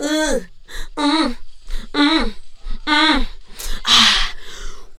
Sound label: UH HAN MM, Funky Vocals; USB Soundscan vol.18